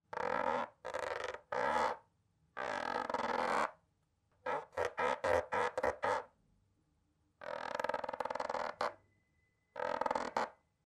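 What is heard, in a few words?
clean dishes sound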